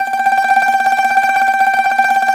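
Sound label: TZU TREM G 4, tzouras tremolo; Soundscan vol.53; Mediteranean Traditions; AKAI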